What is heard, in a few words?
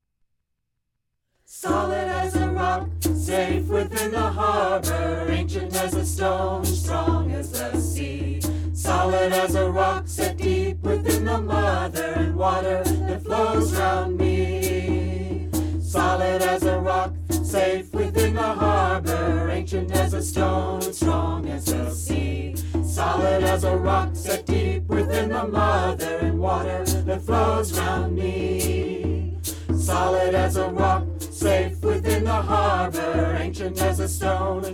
Chants, Water Stone